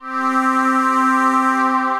Wave Cave, synth pad; Concrete Wave Cave; Ableton; Factory Packs; Synth Essentials

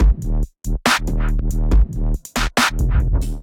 Kit Melodic 140 bpm, Drums; MIDI Clips; Night Shade Kit; Ableton; Factory Packs; Skitter and Step

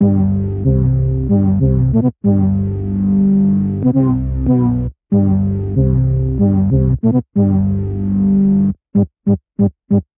Reverse Fuzz C Major 94 bpm; Bassline; Tonal; MIDI Clips; Drive and Glow; Ableton; Factory Packs

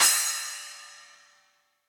Splash 8, Cymbal; Drum Hits; Standard Hits; Cop Style; Ableton; Factory Packs; Session Drums Studio

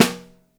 SN Overhead, Snare; Ableton Foundation FreePacks; Snare Designer

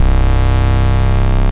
Step Off Growl, Bass; Basses; Ableton; Creative Extensions

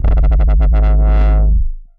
Sub Wobble, synth; Ableton; Factory Packs; The Forge by Hecq

